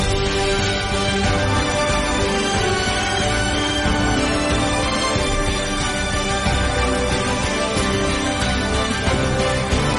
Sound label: samsung s10 ringtone